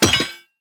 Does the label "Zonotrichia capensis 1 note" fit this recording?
No